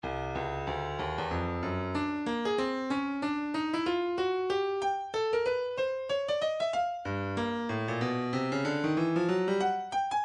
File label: stylus breakbeat midi 117 bpm proghouse